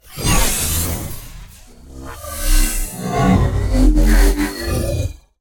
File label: Transforms Synth Whoosher Mutation, Robotic Lifeforms; SoundMorph